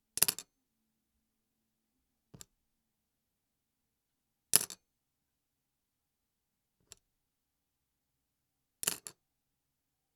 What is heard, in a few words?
Pen Drop